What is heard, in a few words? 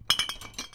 rattle; movement; alchemist